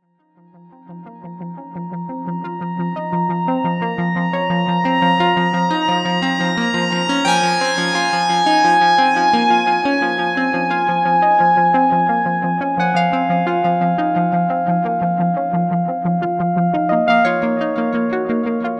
jx10 single sequence

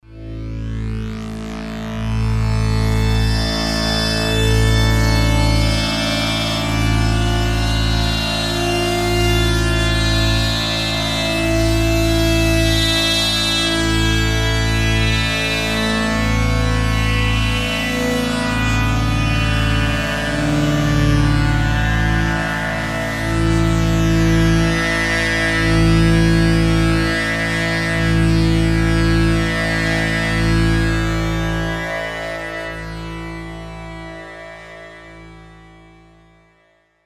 Seaside Pad; Mayer MD900

